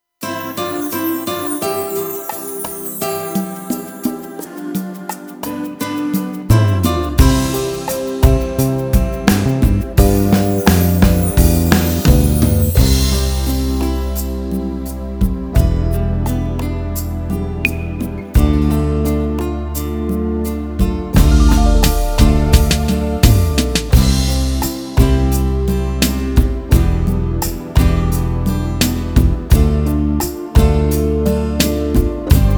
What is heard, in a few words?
Guitar Folk